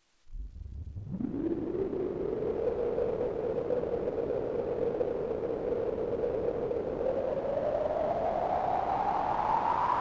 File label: Acidbox II Noisebox; Erica Synths